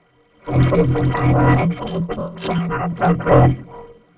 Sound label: metal